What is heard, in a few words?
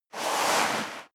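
step dress boots sand slide long, footsteps; schritte; menschen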